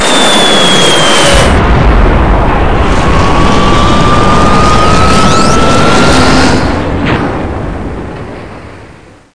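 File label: shuttle land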